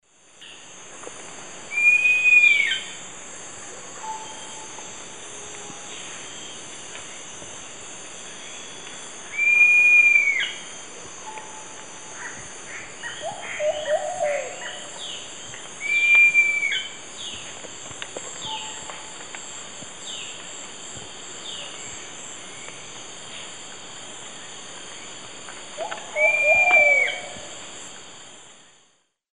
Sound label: Laniocera hypopyrra, call; S. America; Eastern Lowlands